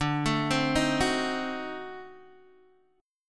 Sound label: D 7th Flat 9th D down Guitar Open D